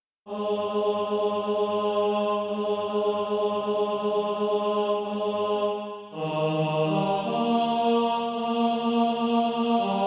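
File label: tenor, Practice Music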